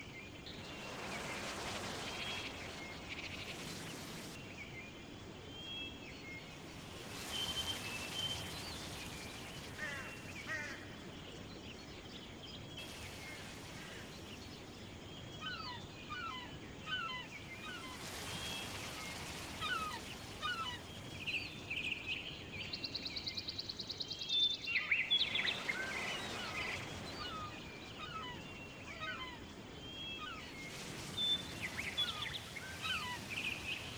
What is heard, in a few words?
beach